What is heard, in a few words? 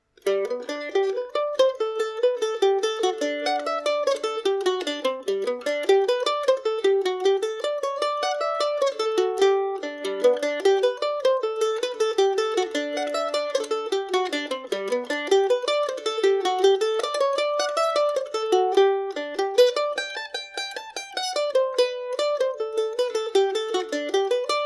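G major, Irish mandolin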